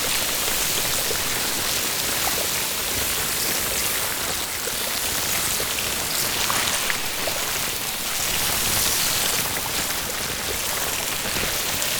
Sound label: Alchemy Grenade Loop Toxin; Warframe; Entrati